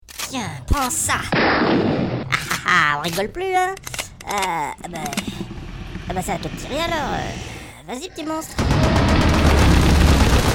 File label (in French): mort mancubus; doom; Totalement inutiles, ces fichiers retracent la mort de marines par certaines créatures...